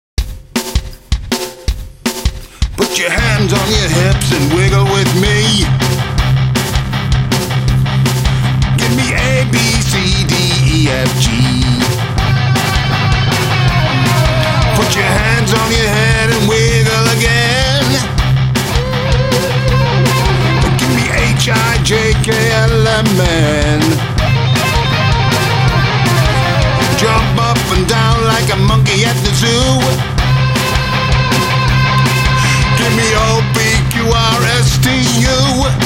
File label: Air Guitar version